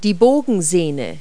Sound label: string; Multi-Lingual Talking Picture Dictionary